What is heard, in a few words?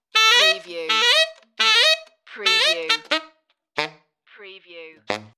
Crazy Sax Sounds